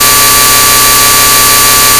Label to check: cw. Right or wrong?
Wrong